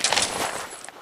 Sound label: draw minigun heavy; tf